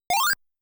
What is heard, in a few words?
Normal Flag Get